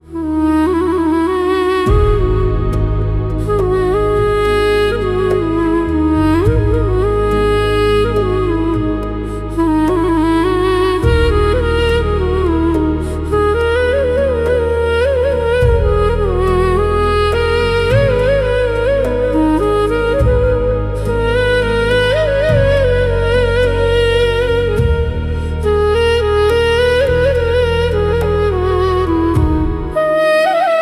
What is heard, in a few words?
Remix